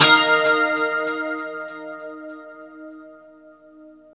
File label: 2CHD D#5, Guitars; Spectrasonics; Hans Zimmer Guitars VOL-1; JC-SHEEN